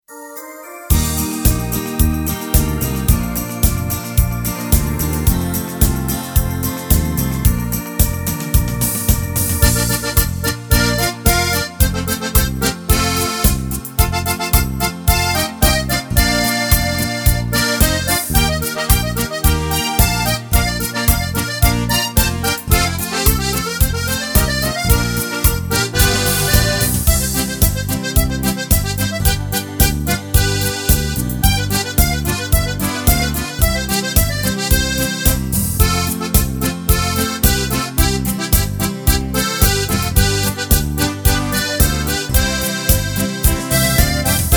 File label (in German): Schlager Rich; AjamSonic